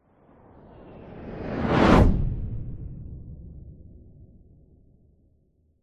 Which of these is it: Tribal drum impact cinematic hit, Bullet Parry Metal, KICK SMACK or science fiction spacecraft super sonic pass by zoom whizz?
science fiction spacecraft super sonic pass by zoom whizz